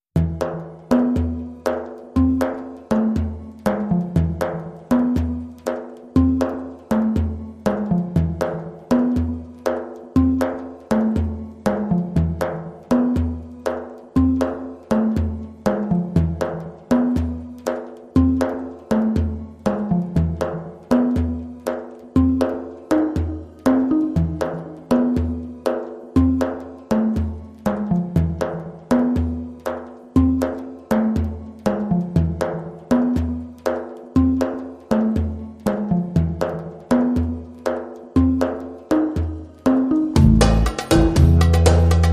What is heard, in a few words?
Middle Eastern Soundscape Underscore